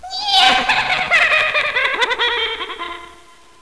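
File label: bomb defuse failure; cstrike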